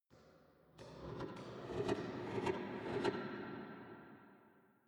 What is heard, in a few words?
small impacts